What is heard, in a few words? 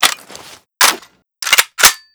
m60 reload end; emplacement